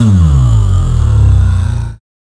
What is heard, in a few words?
Bass Drop; SoundsCrate